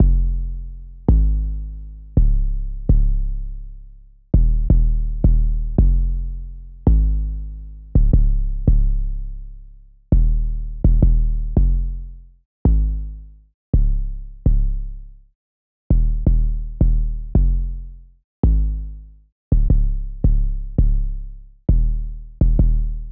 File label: 166 G 808